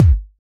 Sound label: Kick